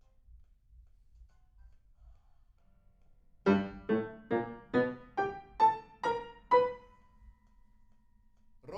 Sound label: PIANO